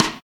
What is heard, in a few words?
Rim; osu